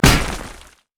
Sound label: missfortune basic attack